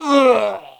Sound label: human die; wesnoth